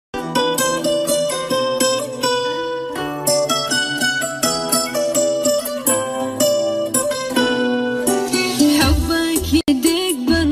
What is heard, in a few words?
female version